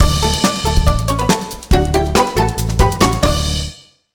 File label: (Jingle) Victory; rayman arena; Tily